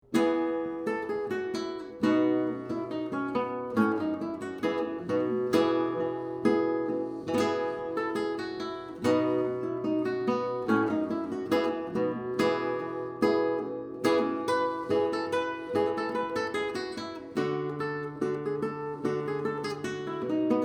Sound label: renaissance guitar; Jesu, Joy of Man's Desiring; flamenco guitar